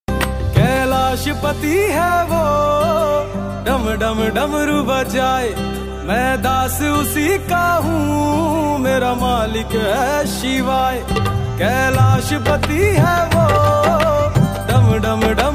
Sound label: ringtone bhakti ringtone; mahadev ringtone